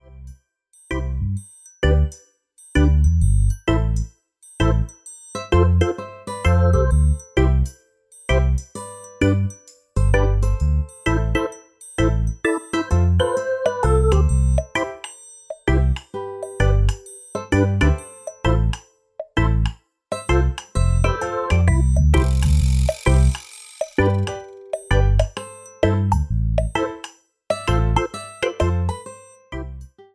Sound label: Reggae 130BPM